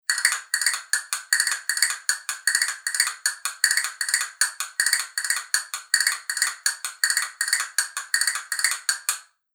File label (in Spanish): castañuelas fibra negra